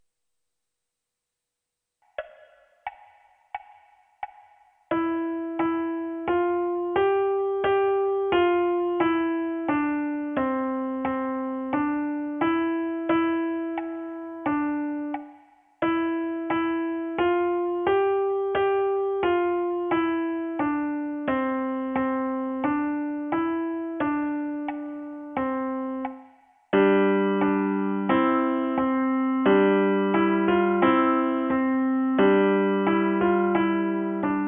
piano unite

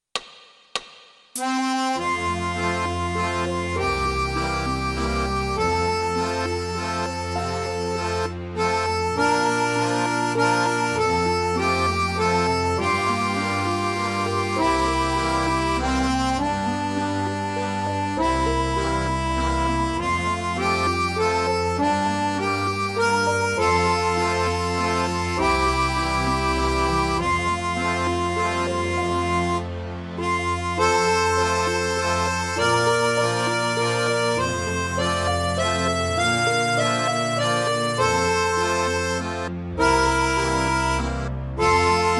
Fisa tutti; Metodo

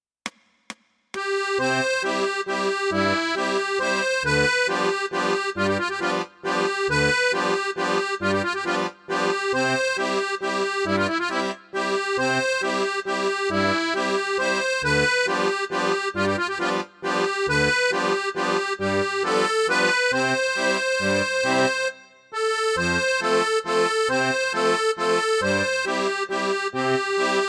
Imparo il trillo, Fisa

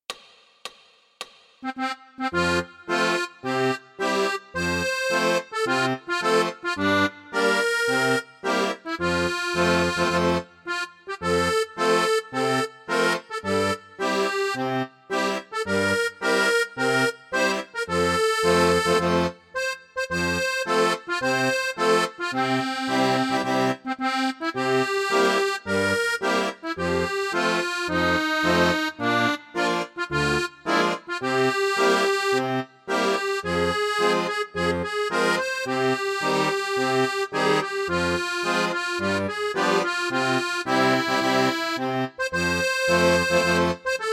Inno Francia (Fisarmonica)

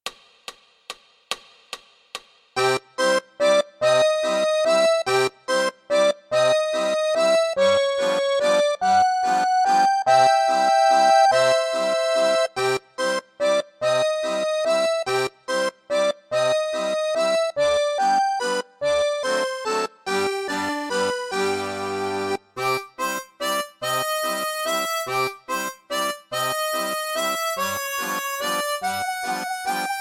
valzer, Fisa; Czerny

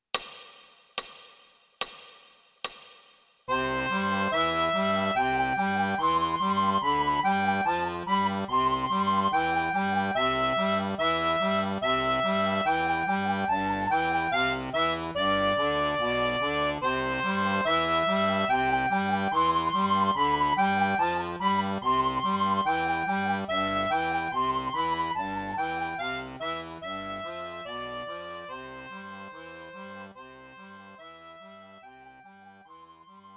Leggerezza (Fisa arpeggio 72)

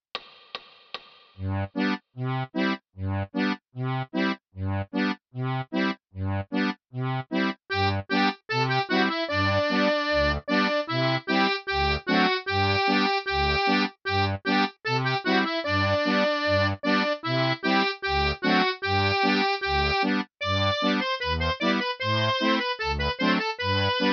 Fisa

